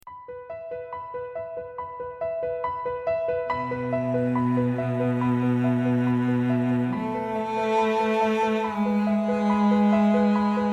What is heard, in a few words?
120bpm, underscore; hartwigmedia